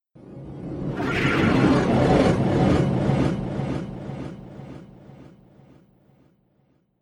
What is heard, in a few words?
Horror Scifi Fx; Sci Fi Sound Effects; Andrew Fleming; Twistedtracks